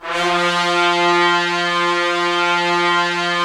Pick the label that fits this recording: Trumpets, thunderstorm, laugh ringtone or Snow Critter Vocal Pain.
Trumpets